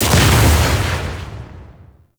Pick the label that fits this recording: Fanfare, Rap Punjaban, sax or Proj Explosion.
Proj Explosion